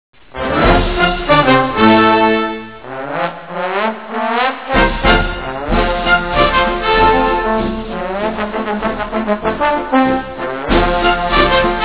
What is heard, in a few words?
Trombone; Lassus Trombone